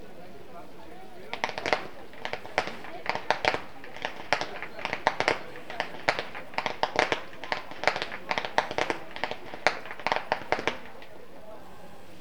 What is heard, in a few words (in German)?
schnalzen, flachgau; anthering